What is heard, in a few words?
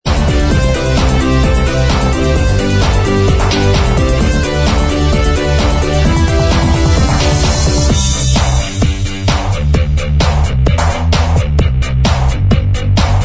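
dance remix instrumental track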